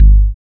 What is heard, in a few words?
kick soft click, lofi